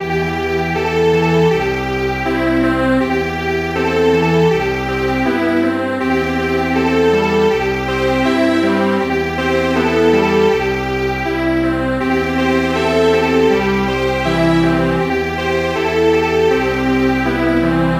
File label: Strings Quartet, traditional